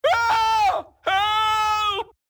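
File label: voice screaming villager; baldursgate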